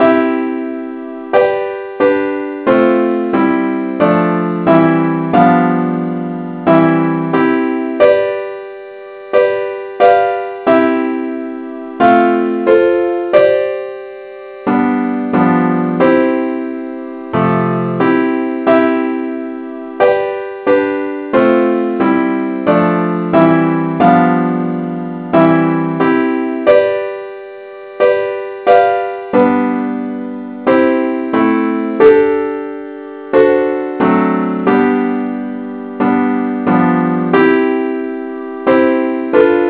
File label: gwalchmai advnce Acc key c